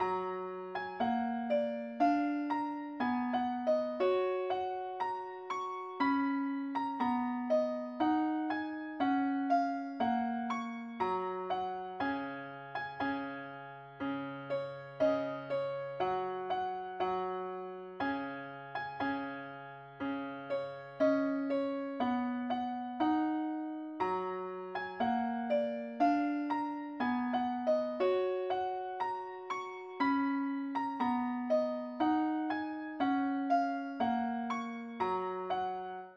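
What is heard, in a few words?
mandolin key f sharp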